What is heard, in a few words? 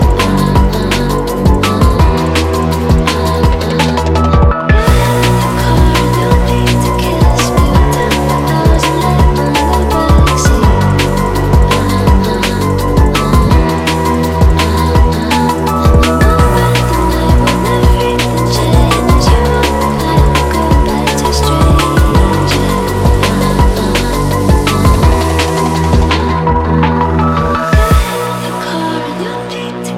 Relax Phonk